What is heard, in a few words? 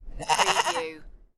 Goat - Baby Goat Bleat